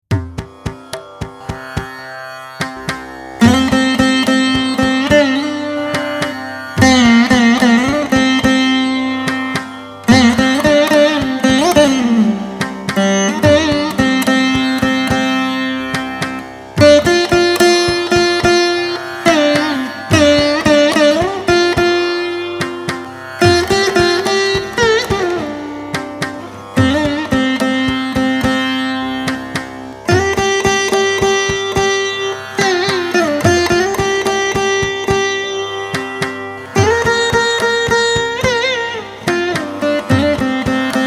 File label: Harivarasanam Veena; sabarimala